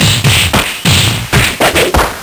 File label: Nintendo Gameboy LSDJ